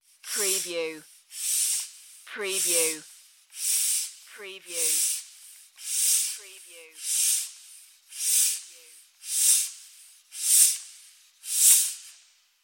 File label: Ball Pump